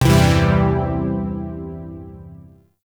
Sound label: Shawzin Phrygian Chord A; Zariman Shawzin